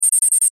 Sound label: Sci Fi Readout Beeps Chime Digital High Pitch; Tech